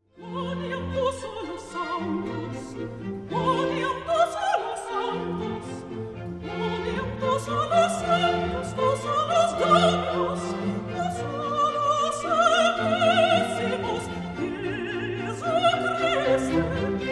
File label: Gloria Quoniam